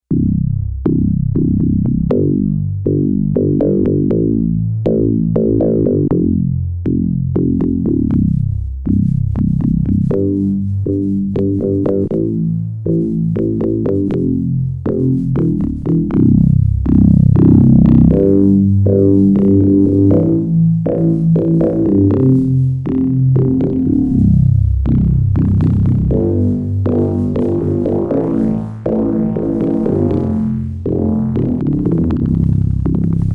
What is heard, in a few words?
Eventide H910 FM Bass Anti Feedback